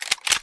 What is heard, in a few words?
de cock, weapons; firearms